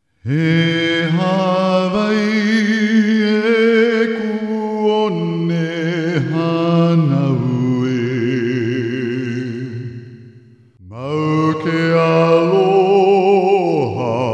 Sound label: hawai'i aloha acapella